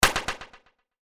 Hit Sweeper Delay; Hit Sweeper Delay Fast; Sweepers; Blastwave

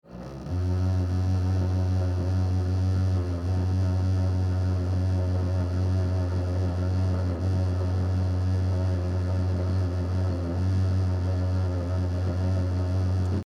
heater Fan defective, Car Heater Fan, Defective; Broken Car Heater Fan , Low Belt Noise; Fans; Electronics

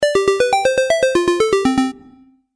Game App Melody, Video Game Sound Effects

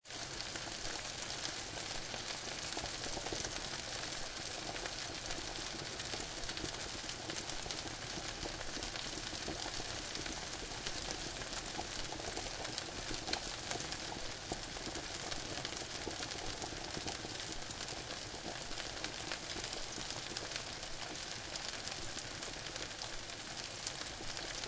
Frying And Boiling; Frying and boiling 2 loop. Kitchen, stove with frying pan and pot boiling water. Version 2. Seamlessly looping sound.

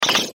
Flash Animation Multimedia; Web Interactive; Button Sound Effects; Blastwave; Technology

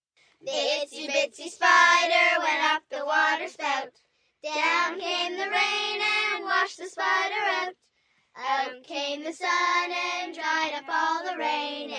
Singing, Group, 6 - 10 children; 'Itsy Bitsy Spider', kids, males, females, girls, boys, song, human voice; Children Sound Effects

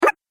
Jump Video Game

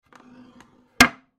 Piano Bench Close Furniture; Piano Sound Effects; Blastwave

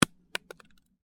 Apple lands on concrete and bounces slightly; Impact; Miscellaneous Impact; Stuart Duffield

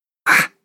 Human Fx Grunt; Andrew Fleming

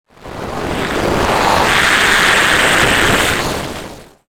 Distortion Warble Modulation Swell; Blastwave

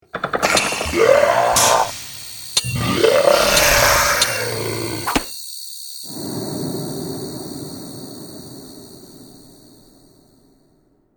Fantasy Battle Scene; Battle Sound Effects

